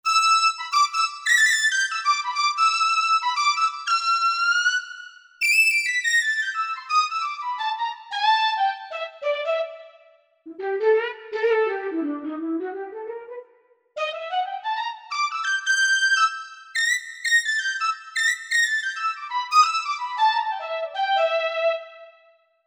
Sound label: Shaka lead; Korg MSC-10S Ethnic